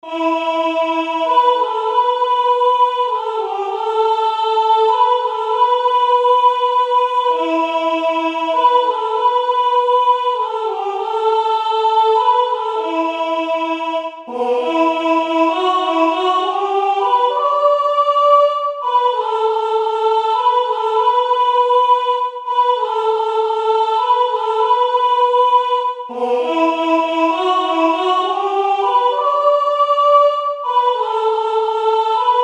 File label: soprano, chorale